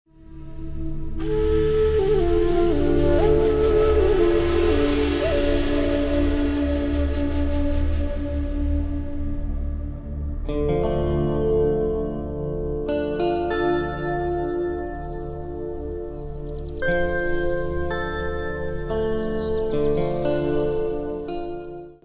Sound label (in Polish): muzyka bez oplat